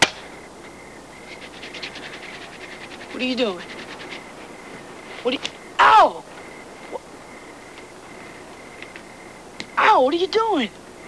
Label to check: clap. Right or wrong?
Right